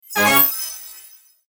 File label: REEL STOP WIN; slots; Golden Barnyard